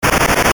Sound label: escape; maoudamashii